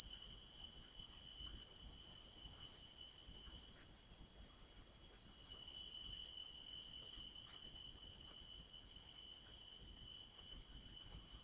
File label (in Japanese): yamatohibari